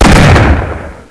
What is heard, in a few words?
dbarrel; wanted; weapons